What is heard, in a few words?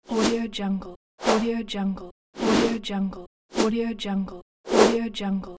swoosh